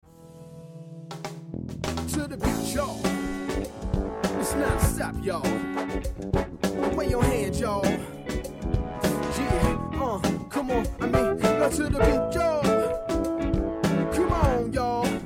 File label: funky combo band